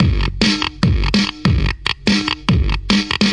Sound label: beat, drum loops and breaks, 145 bpm; 141 to 160 bpm